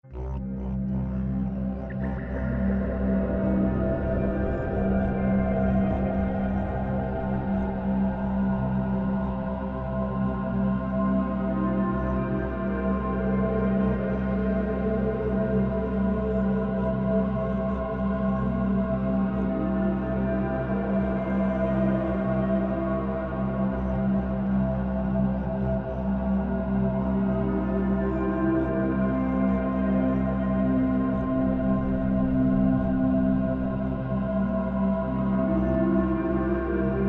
Amb Sound Scape Aboriginal Didgeridoo, Hypnotic effects; Ethnic; Ambient SoundScapes